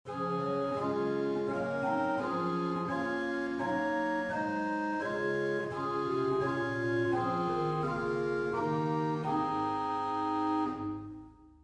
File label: Quintadena 8, organ; Reinhardtsgrimma